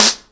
smooth snare single hit E key

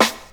dry hip hop snare drum a key; 90s

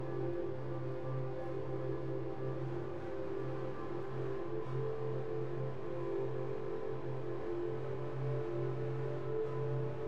Texture Phrase Cold 100 BPM; synth loops

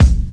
rich mid-range kick drum C key; tuned drums

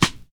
original steel snare drum sample d sharp key

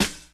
studio acoustic snare sound c key

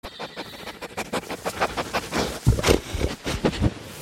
dog growling approaching

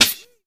dirty steel snare drum sound a sharp key; Duration 0:01

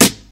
trebly acoustic snare sound g sharp; snare drum samples

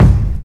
warm kick g key; kick drum samples; drum single hits one shots

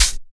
good pedal hi-hat sample a sharp key

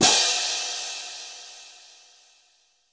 roomy crash cymbal single shot G key

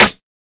00s subtle reverb acoustic snare g sharp key; snare drum samples

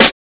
crunchy acoustic snare a sharp key

